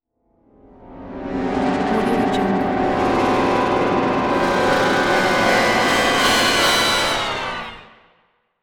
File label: the bad end brass logo; hollywoodedge